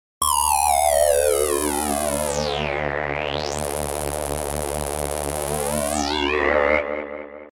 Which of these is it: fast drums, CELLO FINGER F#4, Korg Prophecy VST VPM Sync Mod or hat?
Korg Prophecy VST VPM Sync Mod